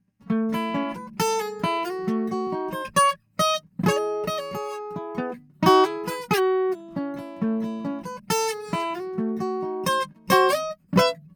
Collings OM2HA DPA 4011, guitars

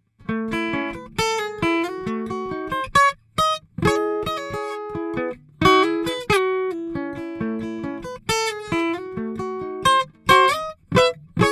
Taylor 314ce Neumann M147

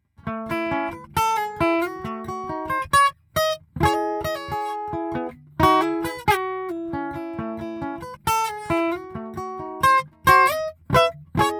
Taylor 814 DPA 4011, guitars

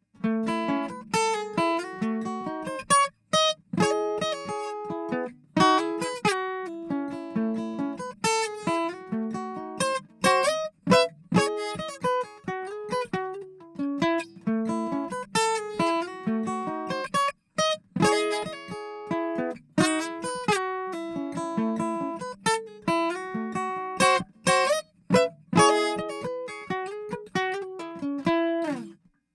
Collings OM2HA AKG CS1000

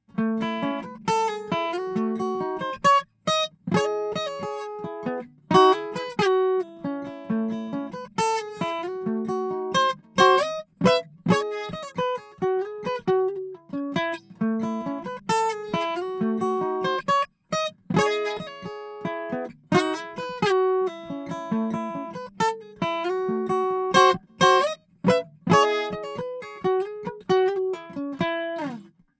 Collings OM2HA Schoeps CMC64G

